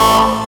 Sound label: SYN, DANCE SYNTH; Sound & Vision - Gigapack I CD 2 (Roland)